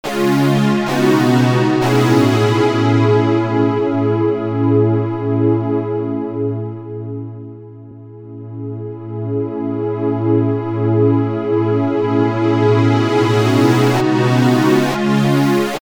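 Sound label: DSS Turnaround Delay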